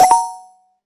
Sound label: magic click